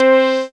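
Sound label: pluck C4, EMS VCS3; Polyend; Simulacrum Sounds; 1 Shots; Synths; Leads and Keys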